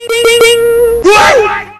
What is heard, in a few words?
SMS